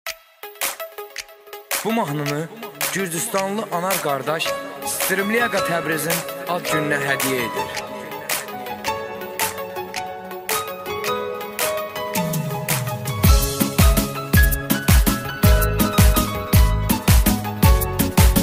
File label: azeri bass music